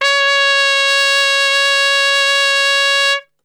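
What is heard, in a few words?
C#3 piccolo trumpet, Brass; Best Service; Brass Super Section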